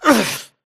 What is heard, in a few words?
grunt death quick; Titanfall 2